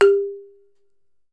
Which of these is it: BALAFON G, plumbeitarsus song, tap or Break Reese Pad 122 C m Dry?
BALAFON G